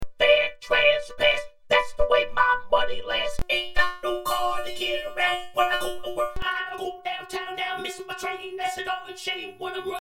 vocodize

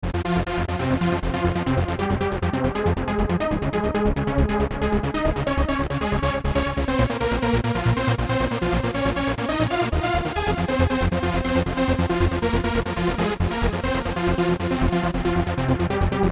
trance super wave

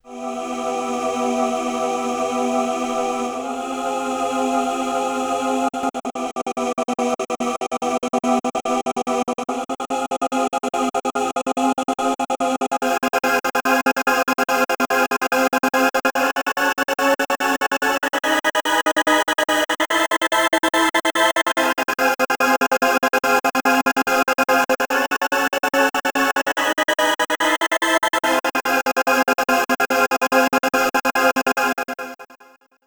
choir trance

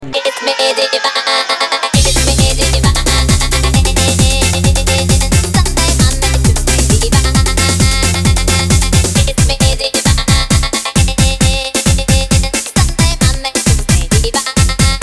big radio sound

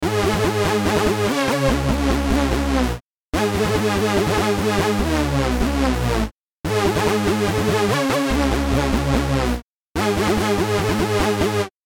hoover

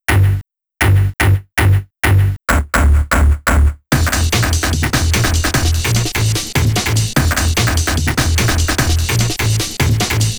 sweet Tom

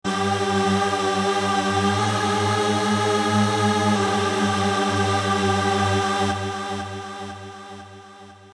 choir pad

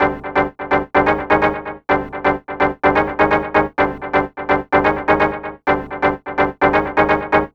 Techno

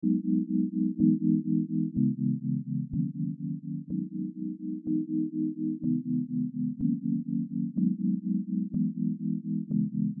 orangelounge deep

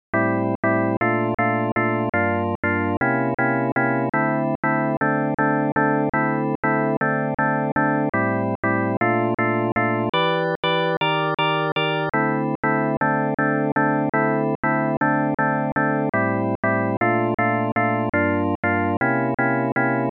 Organized Trio

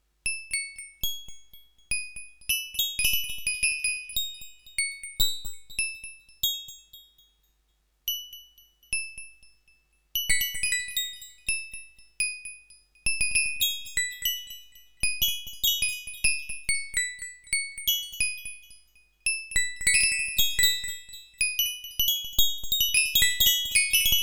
windchimes

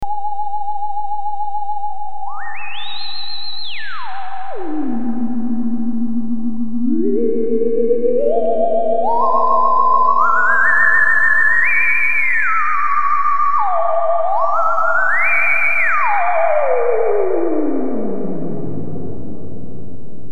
bluenoise theremin

